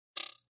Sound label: Clickie; Clicks; Multimedia